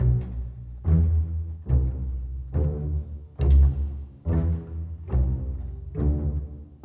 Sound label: Bass Cello, Orchestral; Sonic Foundry (Sony Creative Software); Orchestral VOL-2